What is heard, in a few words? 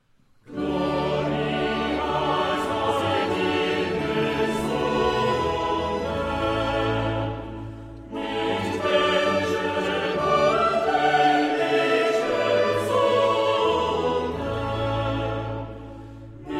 Chorale; Gloria sei dir gesungen; Bach